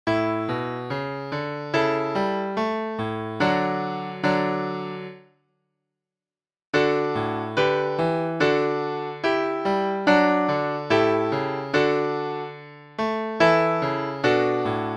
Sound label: piano